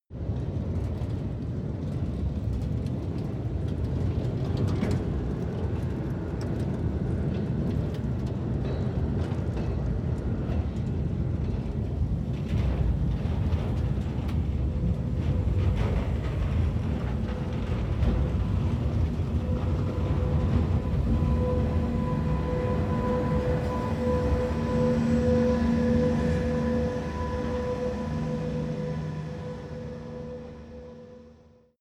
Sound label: train locomotive start rear